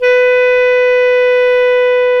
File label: clarinet, Clarinets; Clar Jazz; Roland L-CDX-03 Disk 1